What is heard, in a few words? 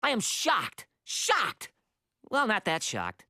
shocked; "I'm SHOCKED!" - Futurama